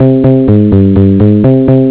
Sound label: tune